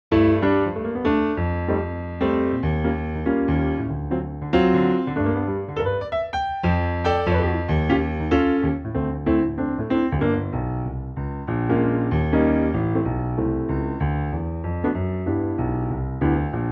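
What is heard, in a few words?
G piano, female backing tracks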